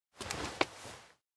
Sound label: step in front; anemoi